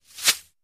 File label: Arrow Sizzle Bys Ligh; bow & arrow bys